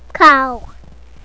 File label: cow